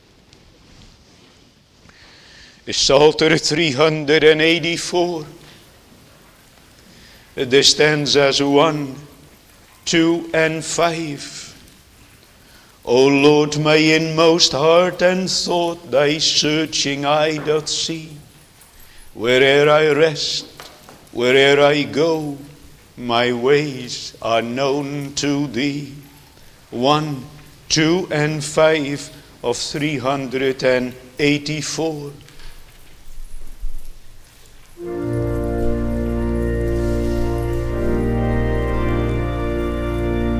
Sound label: Singing; jesus mercy to one taken with palsy 3